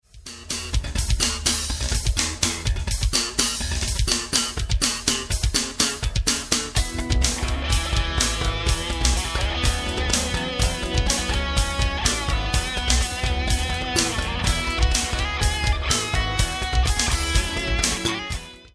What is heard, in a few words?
bassline funky